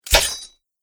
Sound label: Sword Blade Slashes Chainmail Armor; Sword Sound Effects